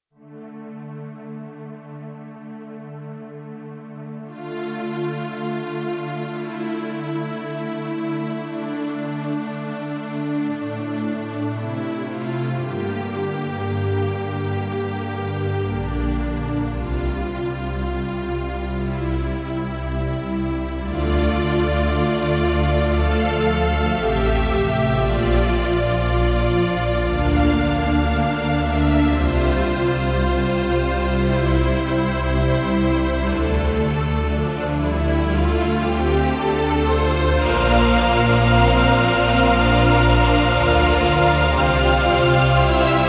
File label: Strings